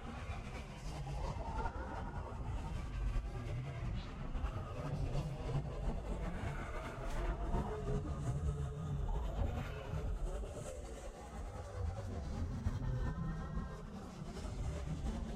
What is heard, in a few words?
amb portal black; shadowisles